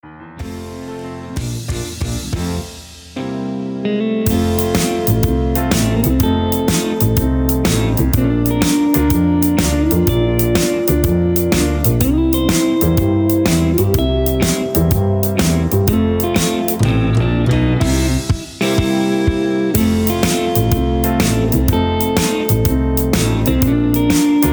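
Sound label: Instrumental with background vocals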